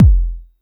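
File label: vermona drm1 mk3 bass drum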